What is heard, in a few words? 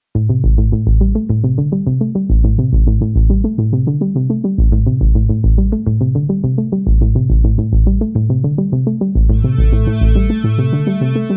tension soft; By Burak Sound Bank; tension gerilim vol 17